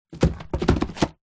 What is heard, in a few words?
present wrapped box fall over; foley; zapsplat